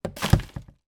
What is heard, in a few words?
food tin soup empty put in trash bin; zapsplat